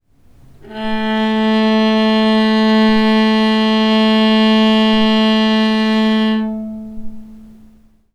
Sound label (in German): Bratsche Mono